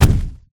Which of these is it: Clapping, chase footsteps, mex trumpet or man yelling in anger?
chase footsteps